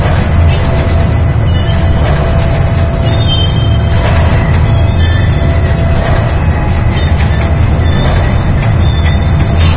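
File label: crusher loop; Source Engine; Portal 2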